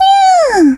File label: voice cat nya; moe pachinko; android game music